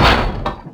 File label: door wood close; left4dead